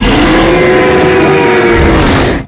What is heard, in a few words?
overcharge; zinogre